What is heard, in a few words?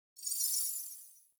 Wyld Bow Draw; Powers; Fey; Saboteur